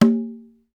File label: CONGA, Roland; Hybrid Kits; Club-50 - Foundations; Thin